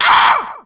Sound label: VOICE SCREAM; cs2d; cityrp; pedestrian